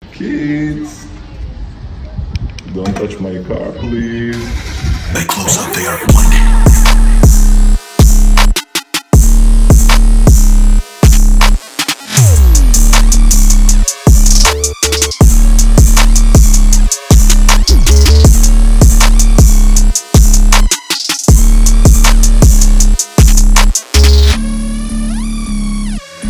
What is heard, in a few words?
Dmin 158 bpm